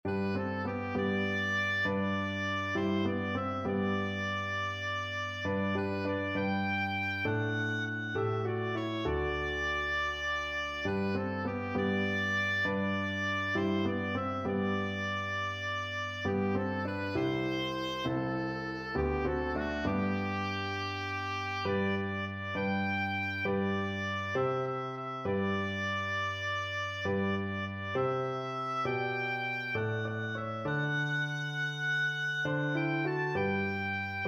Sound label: blessed assurance, oboe